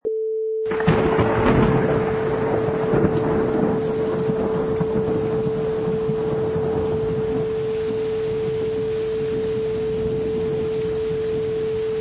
Thunder Clap

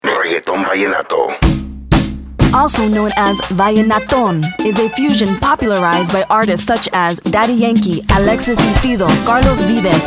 Reggaetton Vallenatto Audio Sample

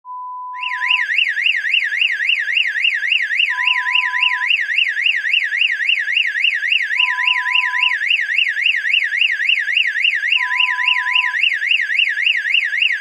Burglar alarm

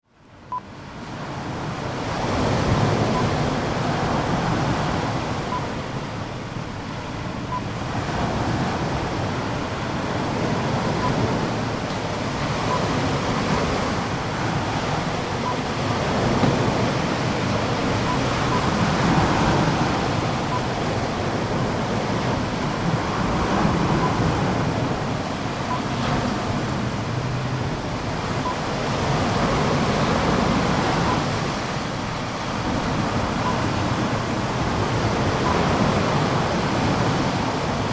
Lovina Beach waves Sand Wind

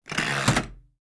rotary switch

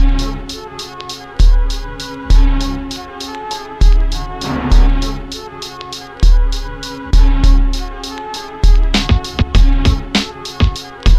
beat

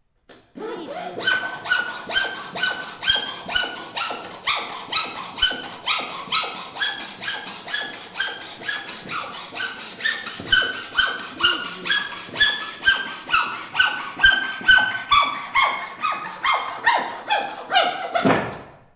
Sawing Dry Wood

